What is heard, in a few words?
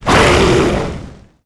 Digger death; KuberaMine